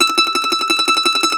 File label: MAND TRM, Mandolin